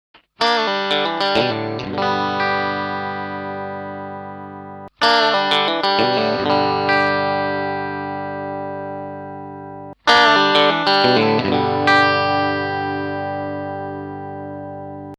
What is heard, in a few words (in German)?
Comp Keel